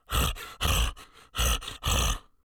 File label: dragon breathing heavy breathing; human sounds